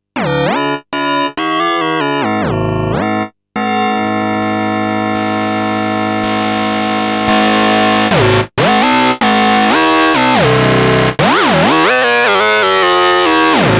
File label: Bitcrush